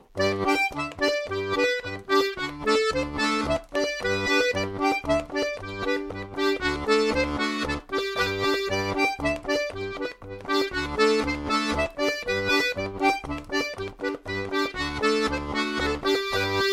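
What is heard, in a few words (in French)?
polka, accordéon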